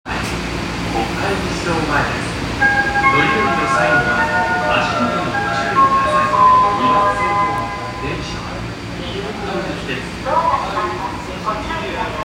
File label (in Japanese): 丸ノ内線国会議事堂前東